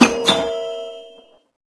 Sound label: pickup